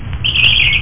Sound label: chirp